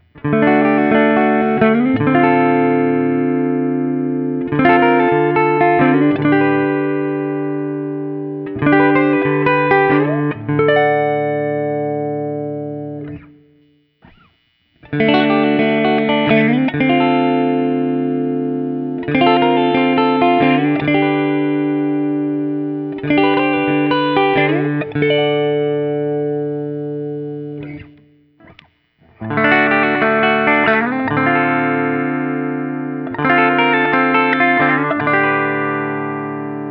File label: Burnside Lance ODS100, guild burnside lance